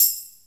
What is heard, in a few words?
jingle bells; Misc Percussion